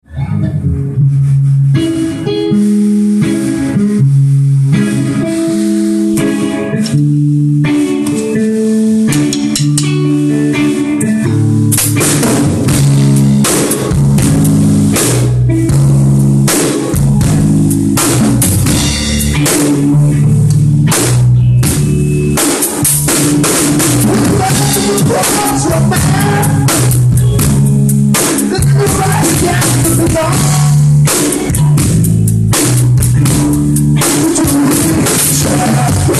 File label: blues